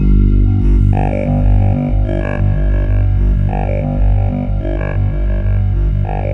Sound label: KORG WAVESTATION; Rubber Chicken Software Sample Bank